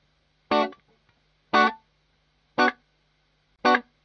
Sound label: strat, guitar